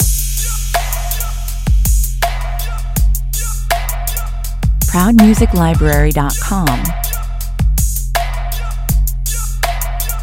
trap this (loop)

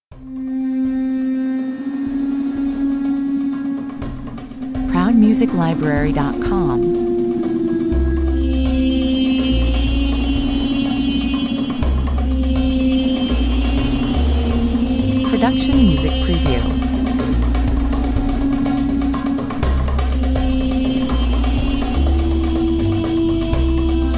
orient 104 bpm

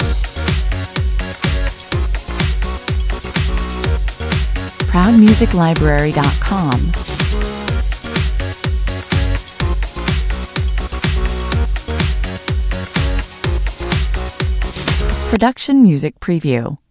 pop loop

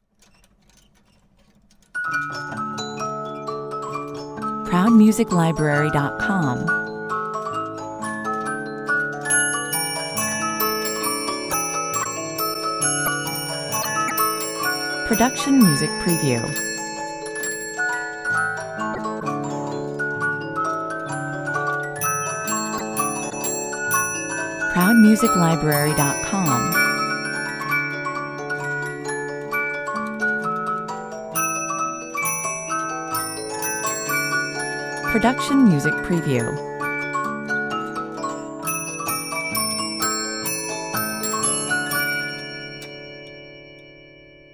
sparkling music box

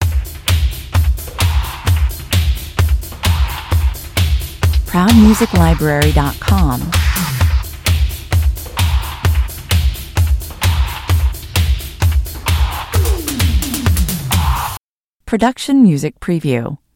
full mix loop pure rhythm

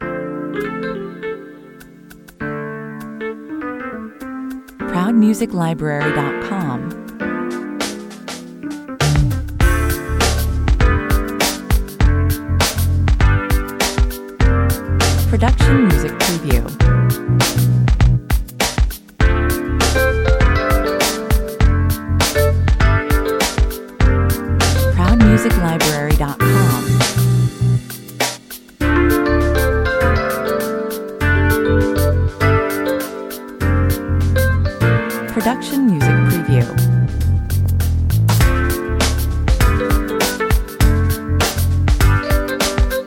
playing guitar 100 bpm

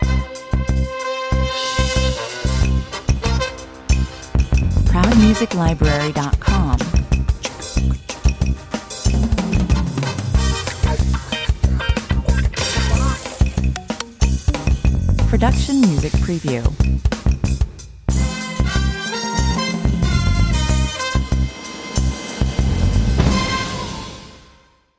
trailer

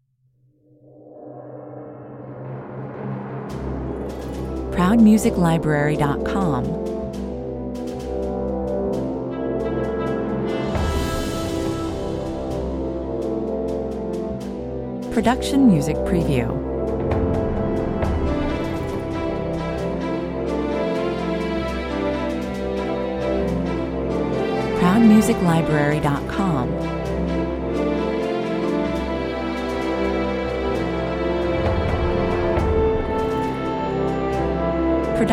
grand orch (intro)

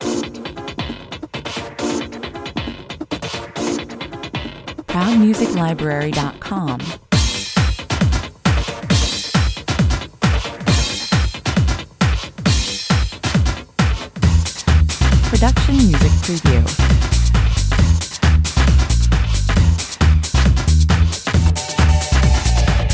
nutcase 135 bpm

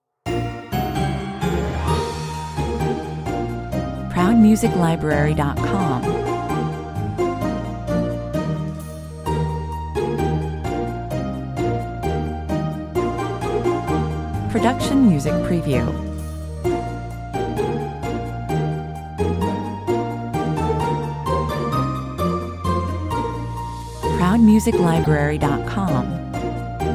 no drum kit

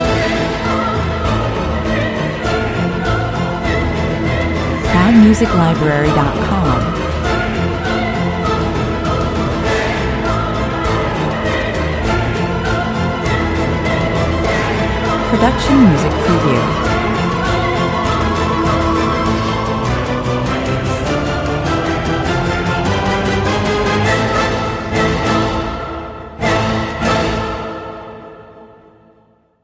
with choir and solo vocal

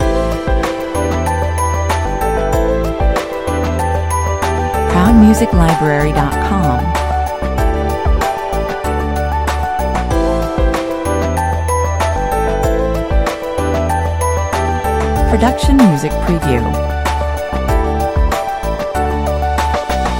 friendly corporate theme loop

